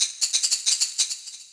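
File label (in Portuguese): som botao, button sound; Xsara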